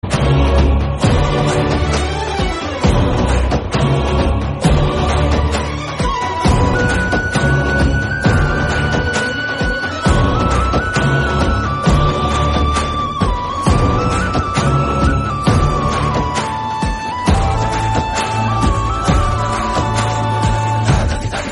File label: Bgm Instrumental; Atrangi Re